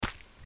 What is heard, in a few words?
biped walk